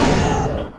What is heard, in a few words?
sound monster hit